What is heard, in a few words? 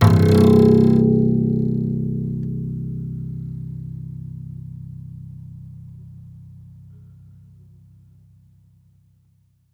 Classical Guitar Extended Technique Plink